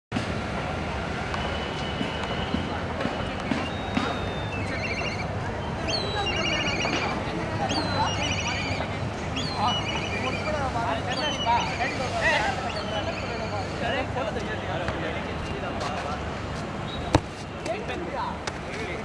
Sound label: bangalore calls